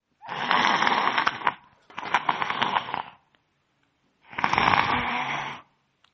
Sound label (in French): zombie